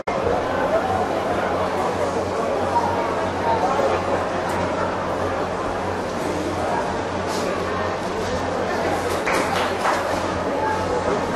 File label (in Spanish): Actividad en el Mercado de Abastos; Fontan; oscardeavila